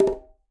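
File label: Quinto B Flam Mute; Latin Percussion; Drums; Drum Hits; Conga; Ableton; Factory Packs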